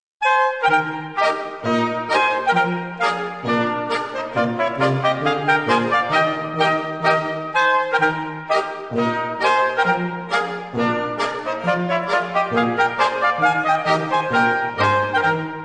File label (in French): Schottisch - Autriche; Morbisch